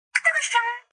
ringtone

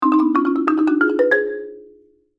marimba ringtone

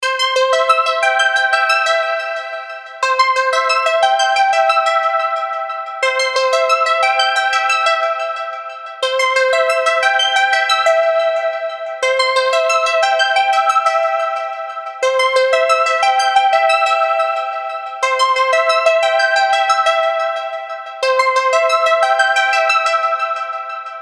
phone ringtone calm

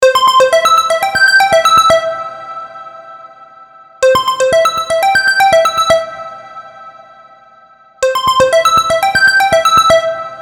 phone ringtone space atmosphere

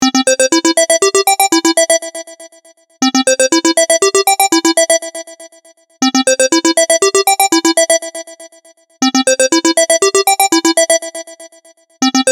phone ringtone intrusive

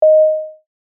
short punchy sine wave ding, D

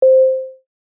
short punchy sine wave ding c

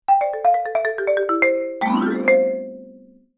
marimba ringtone

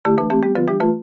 marimba ringtone

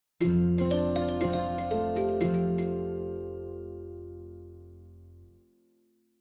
ringtone

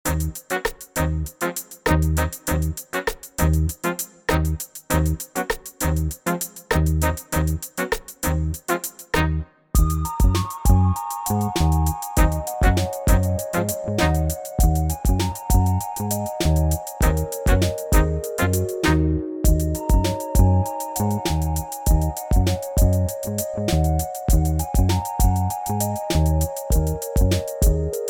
funky and jazzy gang loop

